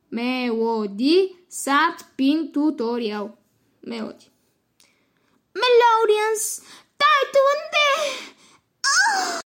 sad pin

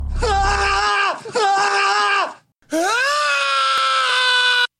ahhhhhh

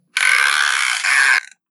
short fishing reel cast sound

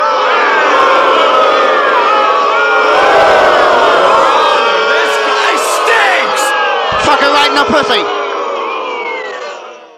booing loud

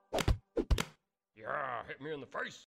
double self slap

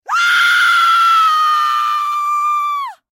scream sound long version; meg thomas

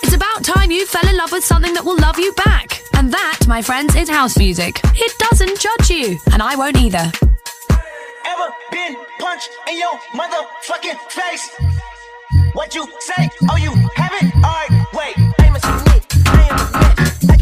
non stop pop house

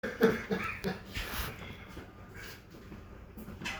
laugh out loud

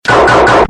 bm bm bm sfx

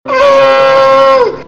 cry

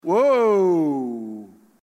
woahhhhh

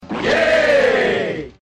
yay

